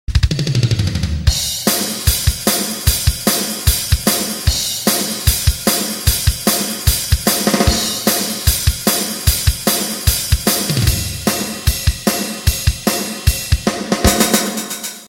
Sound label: Drums Sound